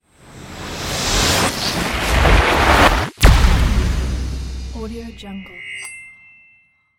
electrical logo

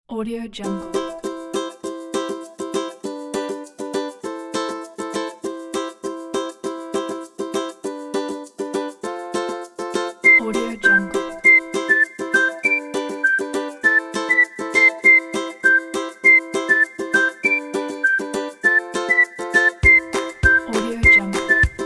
happy kids; audiosky

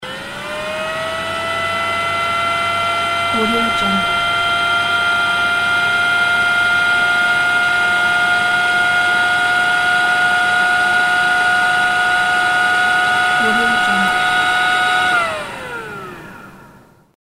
welding machine ventilator

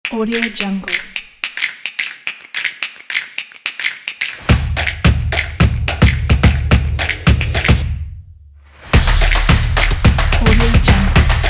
drums

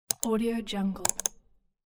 mouse click and double click

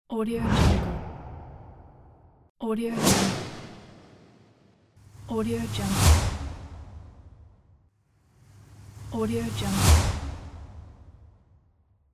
swooshes; medusamusiclab

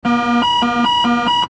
airplane cockpit alarm

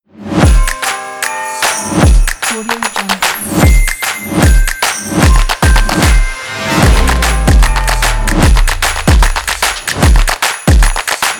happy new year pack

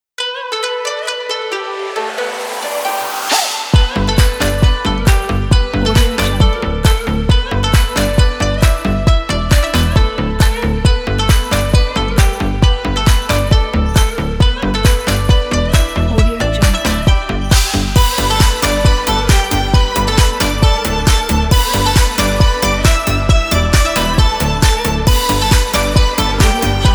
chinese new year festival fun

